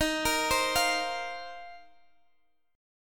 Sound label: F 7th Suspended 4th, chord